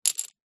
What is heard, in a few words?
shell small drop on others; foley